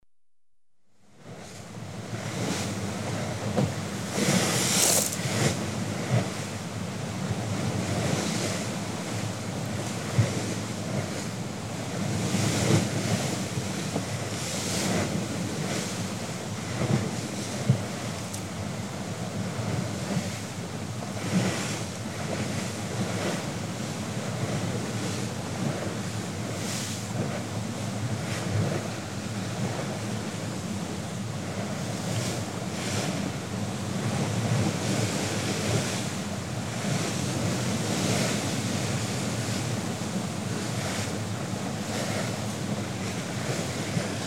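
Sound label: sail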